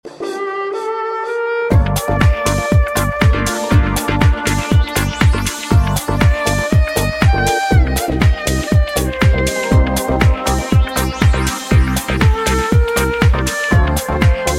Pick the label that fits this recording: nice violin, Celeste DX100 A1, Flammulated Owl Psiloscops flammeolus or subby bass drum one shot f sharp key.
nice violin